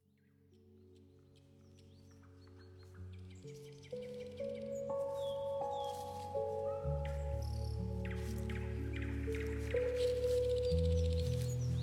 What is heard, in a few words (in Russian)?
Zvuki Prirody, Zvuki Prirody Dlia Relaksa